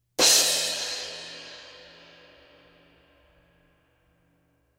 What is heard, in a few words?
crash